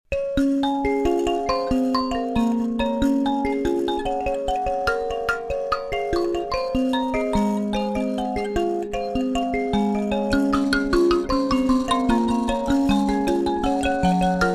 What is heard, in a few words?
mbira